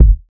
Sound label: studio bass drum single hit g key, kick drum single hit tuned to the G note